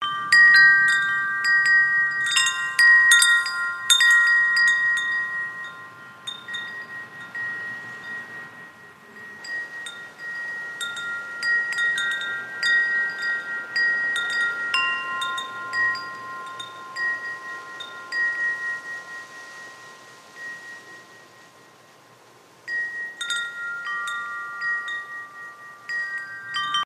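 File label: Ambience Metal Chime Jingle Loop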